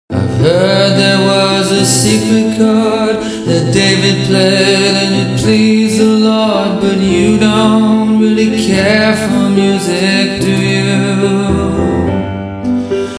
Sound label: Orchestra